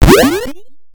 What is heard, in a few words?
Jump Spring 8 Bit